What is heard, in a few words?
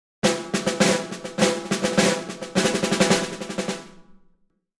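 Drums Snare March Accent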